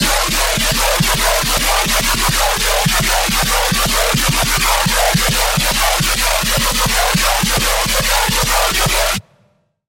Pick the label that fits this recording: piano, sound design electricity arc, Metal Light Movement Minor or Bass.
Bass